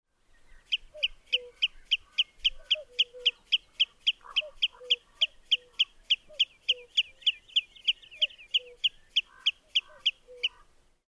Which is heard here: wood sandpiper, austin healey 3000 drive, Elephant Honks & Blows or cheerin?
wood sandpiper